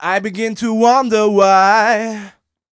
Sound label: m 127 mel a, Vocals